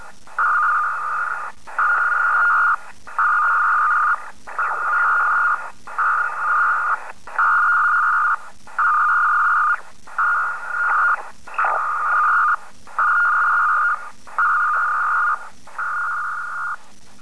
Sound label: pactor